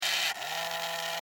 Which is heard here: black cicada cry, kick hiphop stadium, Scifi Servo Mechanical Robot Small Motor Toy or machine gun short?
Scifi Servo Mechanical Robot Small Motor Toy